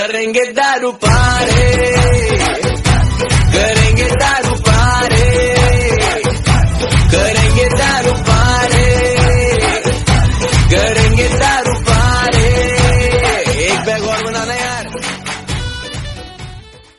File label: birthday party; little singham